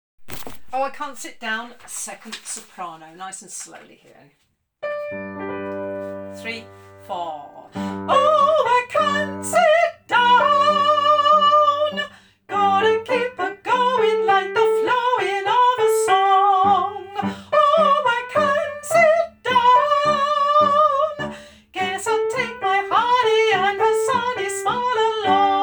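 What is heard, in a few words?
soprano, parts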